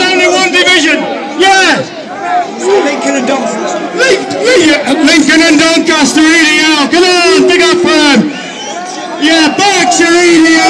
chants; Newcastle

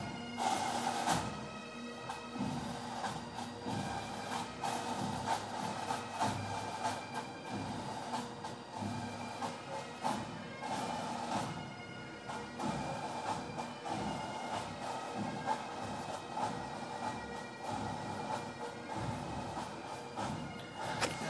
bagpipes

